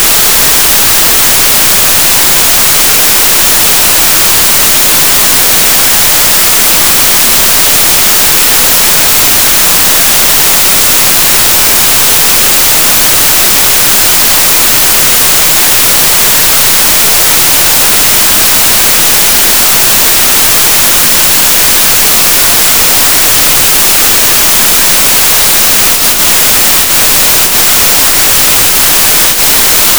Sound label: White Noise